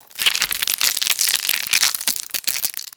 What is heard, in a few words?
pitched down brittle eggshell crunch; food-drink